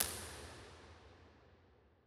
Basilica L, Impulse Responses; Real Places; Ableton Live 12 Lite; Hybrid

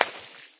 splat miss, paintgun; There; ThereClient

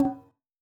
HIT, SFX; beyond-eye-tracking-client; ALIGN

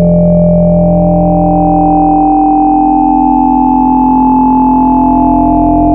Harmonics Vocal Sines; Ableton Live 12 Lite; Vector; Sprites

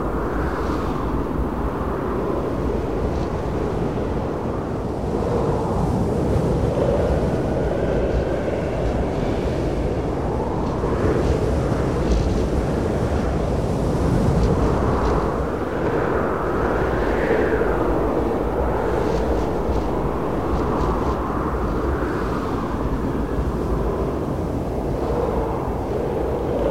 Mountains Sheltered From Wind Loop Quad; Journey

